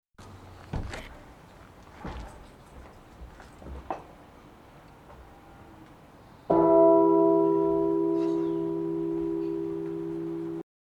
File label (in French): cloche; Lille